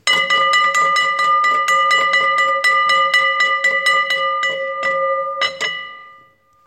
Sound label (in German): Feuerglocke